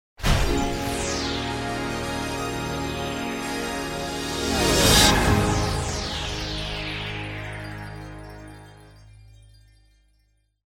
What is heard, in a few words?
Legal ID Bed